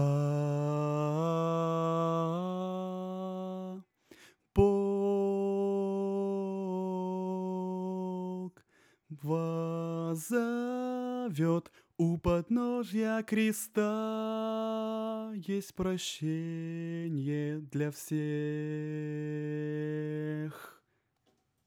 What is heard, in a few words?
alto